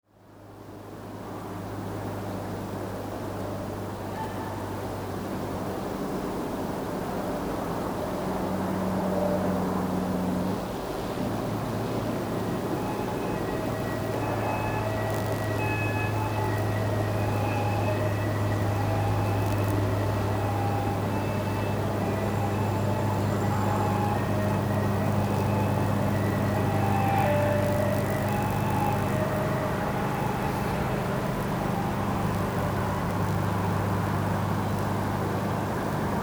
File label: ambulance london